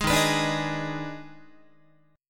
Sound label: Eb Major 7th Suspended 4th Sharp 5th, Guitar, chord; strummed; EbM7sus4#5